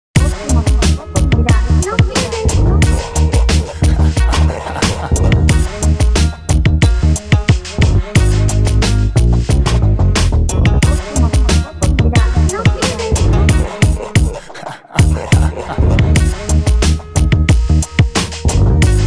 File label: Buzz Beat